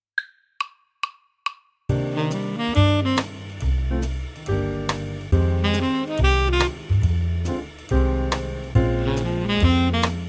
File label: Minor 7 lick, saxophone